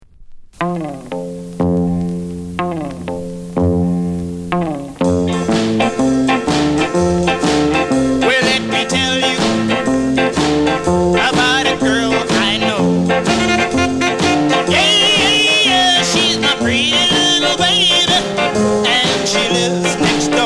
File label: DRUM